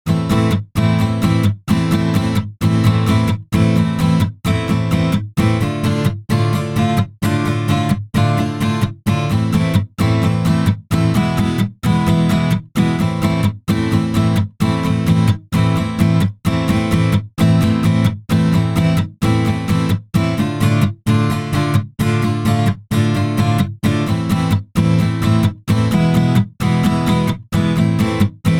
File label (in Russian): Гитара